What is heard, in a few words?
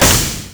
steam half sec